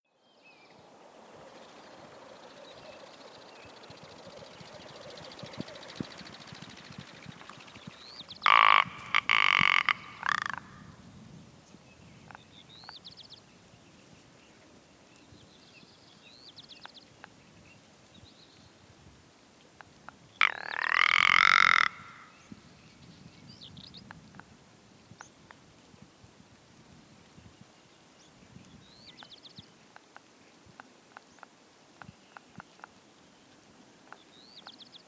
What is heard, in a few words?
Rock Ptarmigan Lagopus muta helvetica